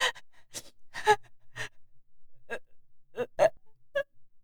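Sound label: horror crying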